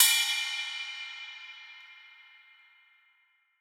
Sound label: Ride; Riddim; One-Shots; Hats and Cymbals; Ghosthack; Riddim Essentials Volume 2